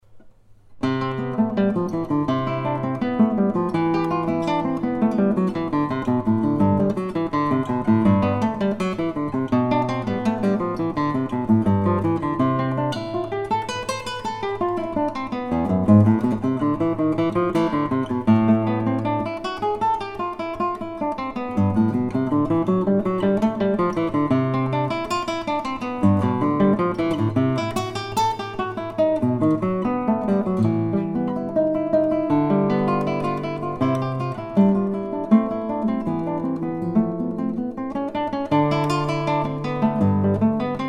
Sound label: Carcassi study, classical acoustic guitar